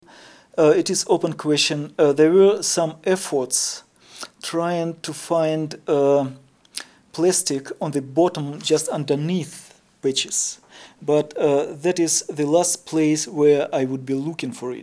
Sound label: plastic on floor